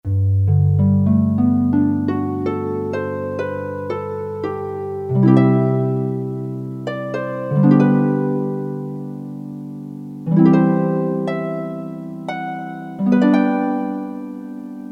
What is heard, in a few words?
Low HARP; Flutes & Harp